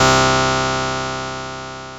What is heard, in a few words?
Ensoniq SQ-80 Harpsichord C3